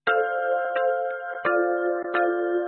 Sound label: Cute Sms Ringtone